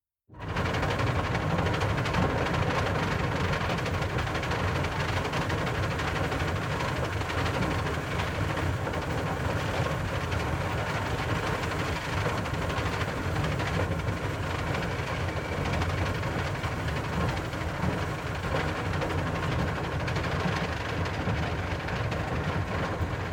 Industrial Mechanical Grain Dryer Grain Intake Pit Empty Start Run Stop Rattling; Hardware